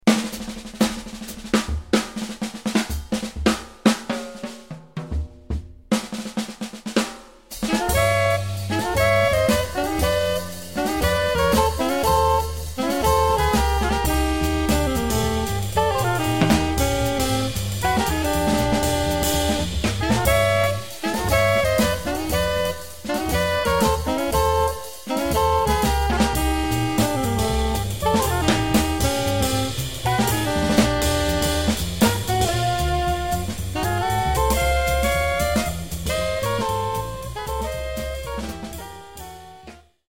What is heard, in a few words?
minus Piano